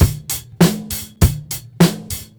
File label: beat, Roots Funk; USB Soundscan vol.41; AKAI